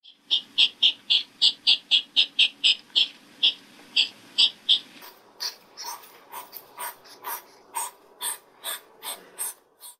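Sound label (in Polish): gniazdo jeża, głos; multigrafia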